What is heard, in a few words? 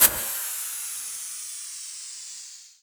noise fx; Masterbits; Switch FX